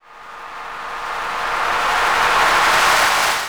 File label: Uplifter